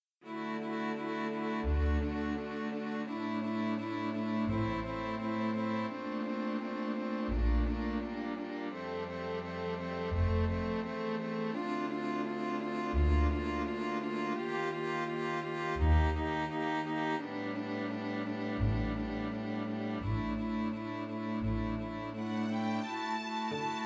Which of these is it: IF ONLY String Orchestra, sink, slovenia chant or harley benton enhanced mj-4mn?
IF ONLY String Orchestra